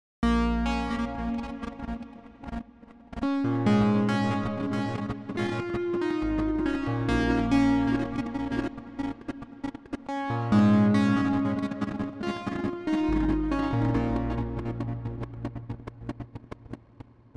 bad connection spring box glitch

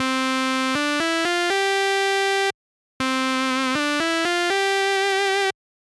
Vibrato ReaSynth

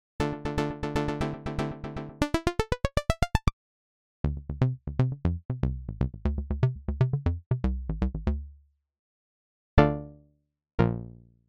hammer and chord

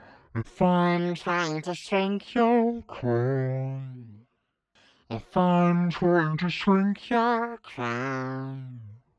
warble formant mod

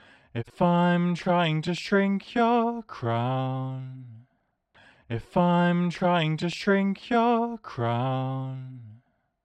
warble subtle

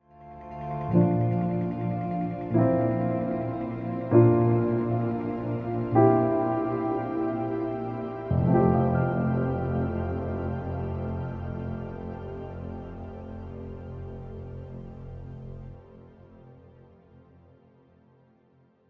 reverb piano sparkle; atlantis-reverb

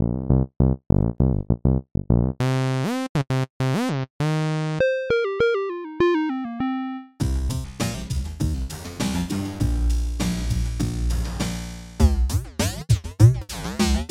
hammer and string

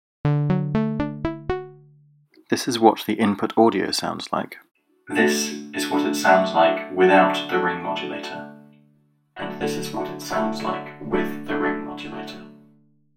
ringmod

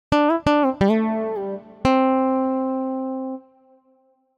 hammer and chord legato

